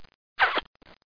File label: kiss